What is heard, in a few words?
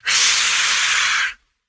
biter roar long, creatures; factorio; Factorio 0.14.21